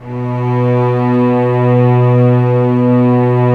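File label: cellos mf ambient; Roland LCDP13 String Sections; STR Vcs6 mf Amb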